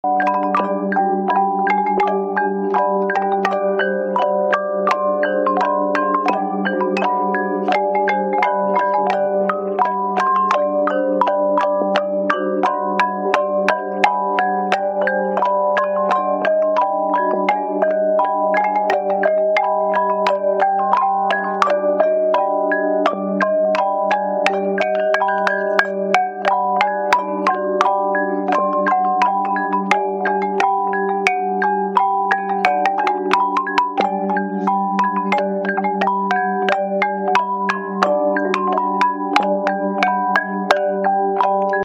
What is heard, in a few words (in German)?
steine und gongs